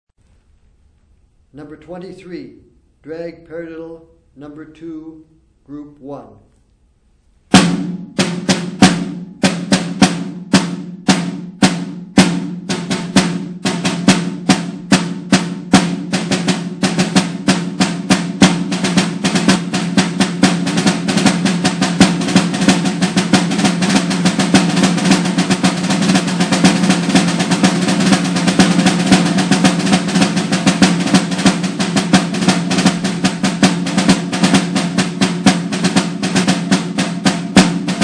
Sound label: snare rudiment